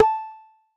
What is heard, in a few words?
popper note; HeavenStudio; launch Party